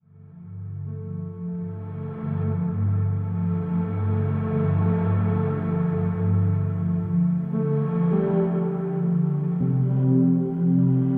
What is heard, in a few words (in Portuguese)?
Relaxamento Soundscape